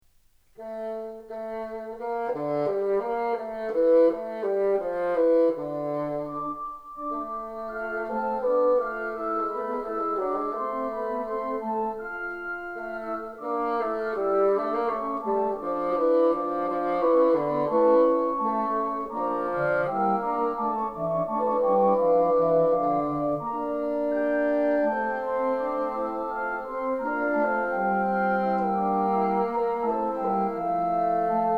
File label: Sonata in F